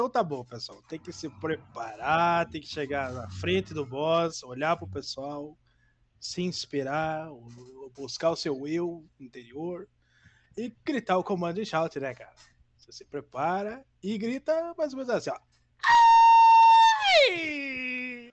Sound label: comanding shout